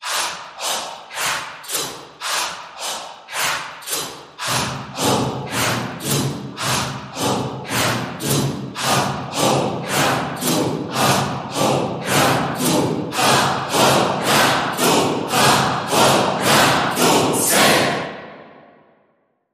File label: Vocals Chanting Pulse